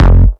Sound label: EDM; Warframe; Warframes; Bard; Bard Instrument Packs